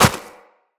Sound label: Run Snow, Footsteps; Operator